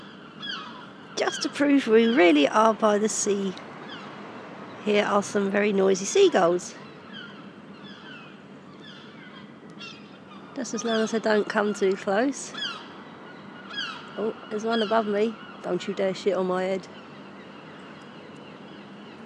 noisy seagulls

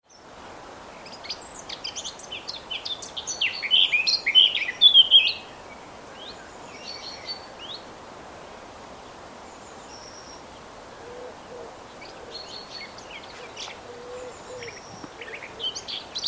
blackcap at the oak tree low carbon farm

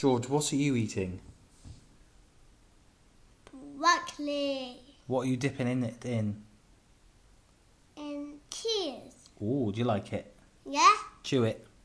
chomping the brocolli

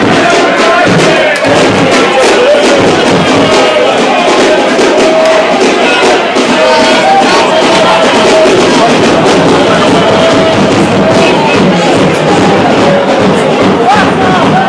england fans

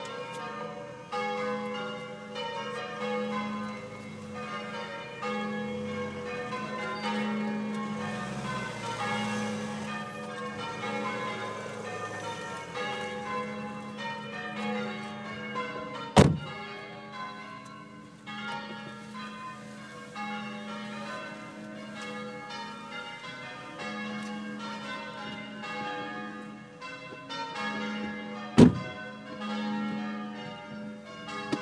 church bells, from outside

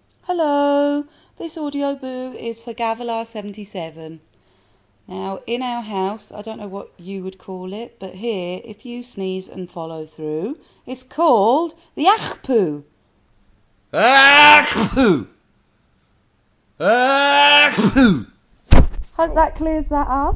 the sneeze follow through

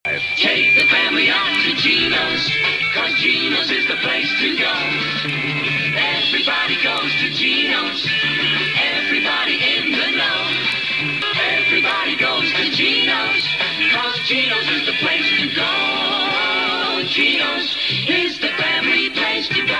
jingle

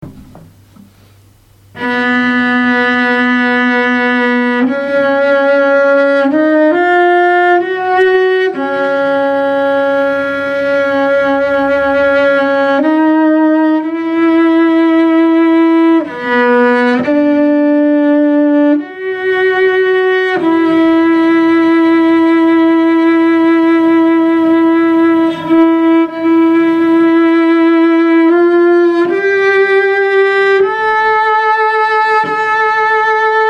theme on the cello

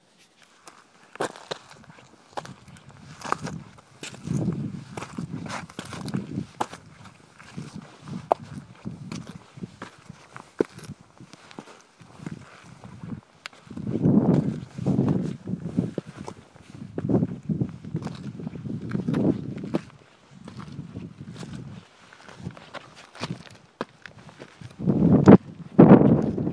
ben an lochan footsteps

